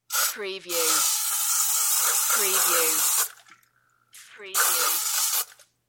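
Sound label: Aerosol Spray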